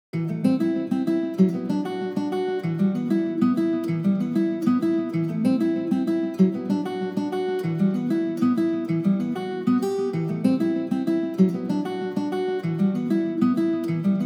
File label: Guitar, 96 BPM E Min; Cymatics; Infinity Melody Collection; Avarice